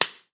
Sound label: Slap; talking tom cat 2 android ios online gamerip 2011